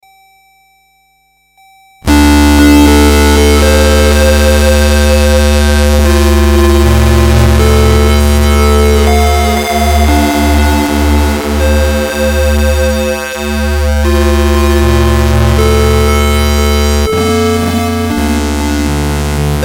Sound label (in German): Enzo Mono Synth Pur